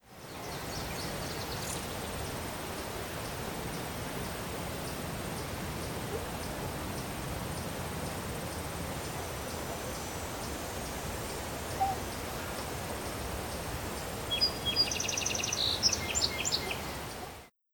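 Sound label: Molokai Farm ambience; Google Earth VR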